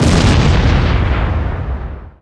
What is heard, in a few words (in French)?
Explosion